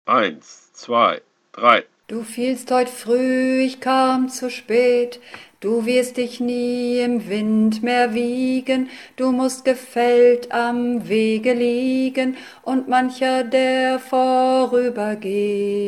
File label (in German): Bass